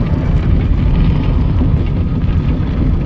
Sci-fi Door Loop; SFX Kit; Sci-Fi Loops

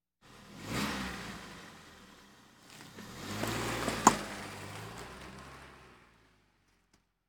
Automobiles various; Valentino Production Sound Effects Library CD50

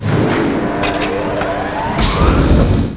E Motor Door; 1001 Sound Effects

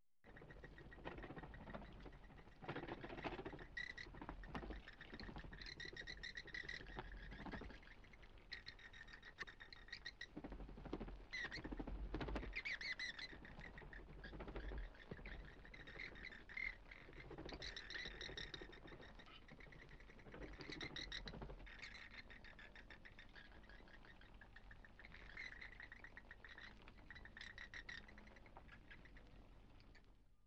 Bats, Scary Sounds; 1001 Sound Effects; Halloween Horror Sounds Effects

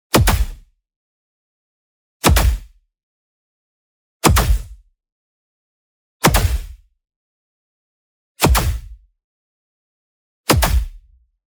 PUNCH Smack Back; boom library; Anime Essentials

